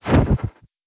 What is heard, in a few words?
pickup fire; Learn to Code From Zero With Godot; fire spells